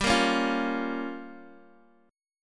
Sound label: G Minor 7th Flat 5th, Guitar Open D